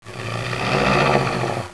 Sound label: gonome idle; svencoop